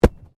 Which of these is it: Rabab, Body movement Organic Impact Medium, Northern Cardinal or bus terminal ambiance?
Body movement Organic Impact Medium